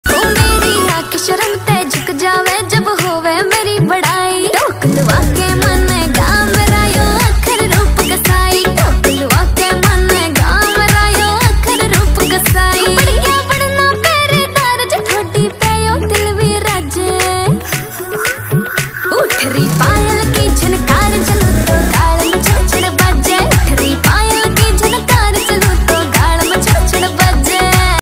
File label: haryanvi ringtone